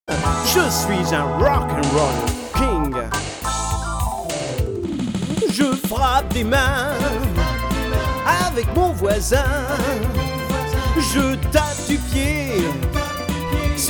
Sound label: rock